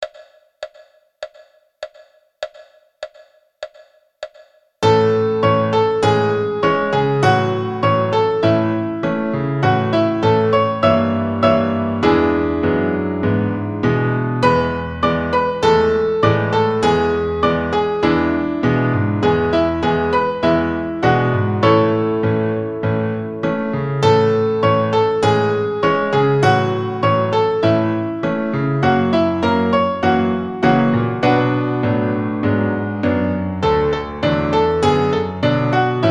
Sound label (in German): uebung 4 haendig piano